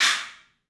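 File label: CLUB CYMBALS; 3D Sound; 3D Drums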